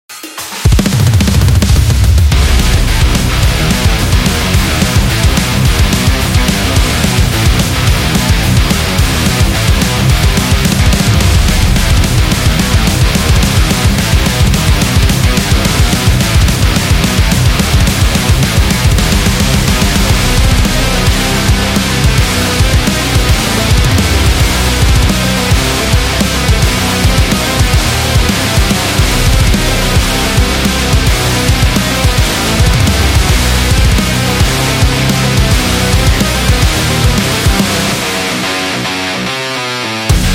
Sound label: The Metal